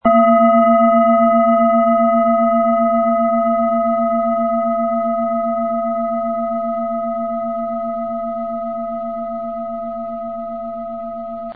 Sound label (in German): assam klangschale